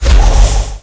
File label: SKULLMINI Attack; Eft